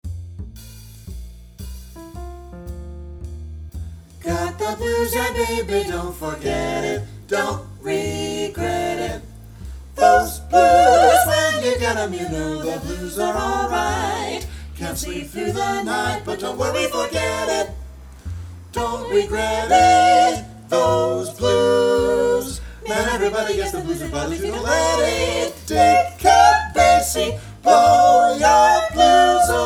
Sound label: Vocal Blues Etude in F Alto missing; NHMEA Jazz All-State Auditions and Festival